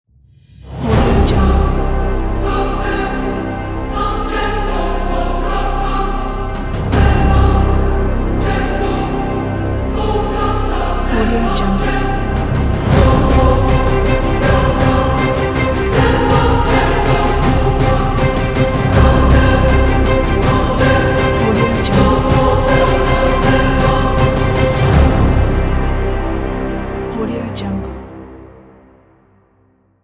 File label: tense choir